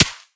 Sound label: sand, bullet collide; STALKER; IWP